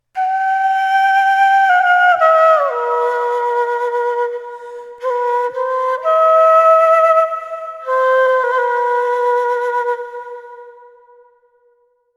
Andean Flute Short Melody

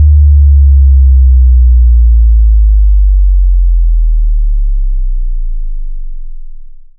Bass Drop Huge

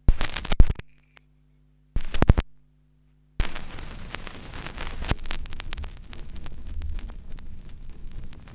Vinyl Cut

